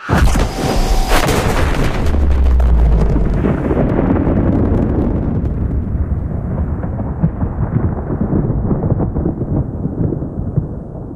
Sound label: laser; 501st-Aux-Mod; RD501 Zeus